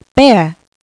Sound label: bear; BibleROM: Creation